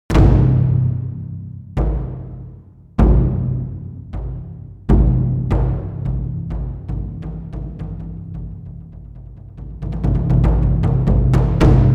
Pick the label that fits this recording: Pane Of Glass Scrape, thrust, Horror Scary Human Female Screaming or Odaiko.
Odaiko